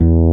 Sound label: E4 FRETLESS; E-MU Formula 4000 Series Vol. 1; Hip Hop Nation; Raw Phatt